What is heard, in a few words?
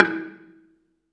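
Balafon 2 E3, Asian Traditions; Soundscan vol.60; AKAI; BELAFON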